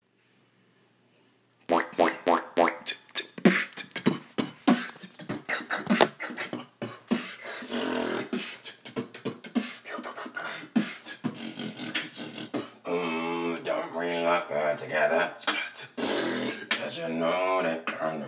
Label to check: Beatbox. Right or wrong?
Right